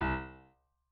piano

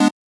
Chord

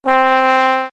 Trumpet

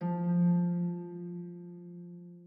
harp

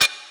vox

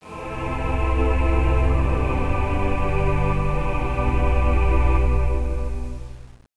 pad; Angelic Layer

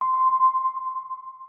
organ

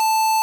pico A4

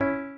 piano

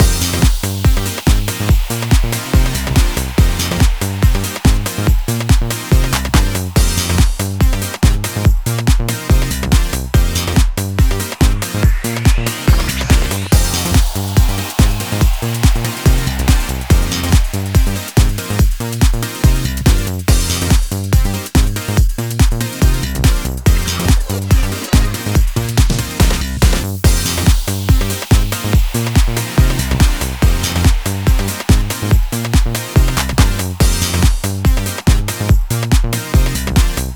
JINGLE TITLE; maimai

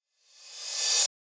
cymbal rev

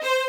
violin

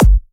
Dr Kick

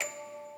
musicbox

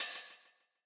hat

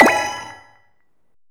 SE LEVEL REMASTER; maimai

